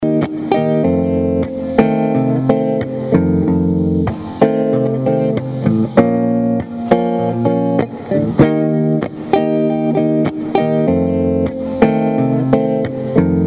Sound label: melodic minor mode V play along, guitar